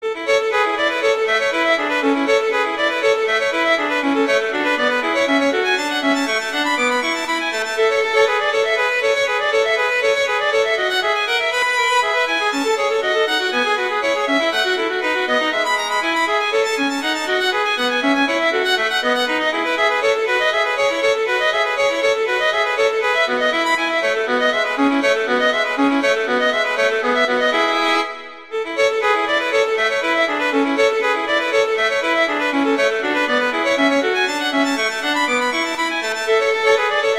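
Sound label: Toccata, Viola Piano; P.D. Paradisi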